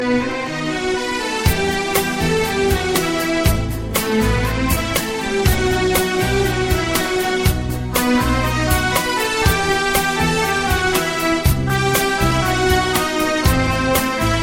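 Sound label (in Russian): скрипка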